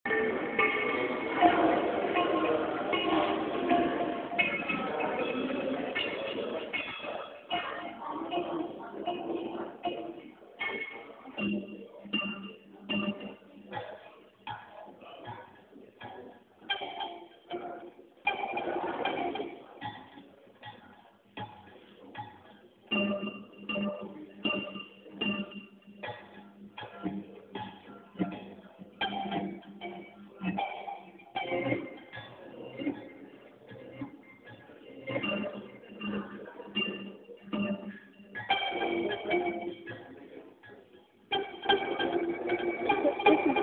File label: eggslicer